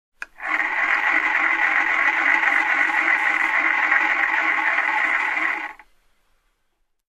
Unwinding Sound

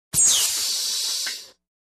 Pressure Tank Hissing

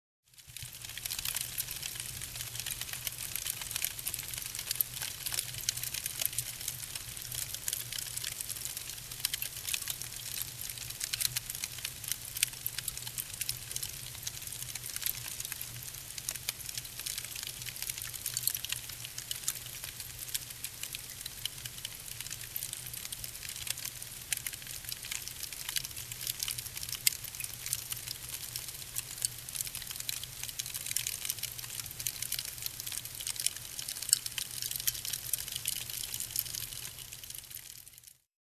Small fire burning close

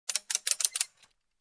Tighten belt

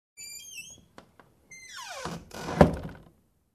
Creaky Door open close

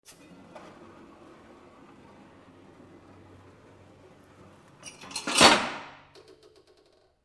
Industrial Machine check up with tools

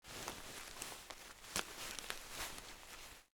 Rubbing cloth short

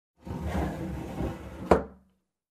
Long wooden drawer open

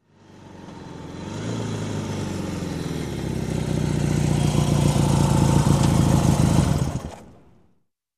Motorcycle stopping by

